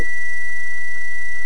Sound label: steady